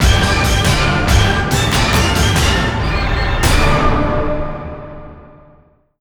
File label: jingle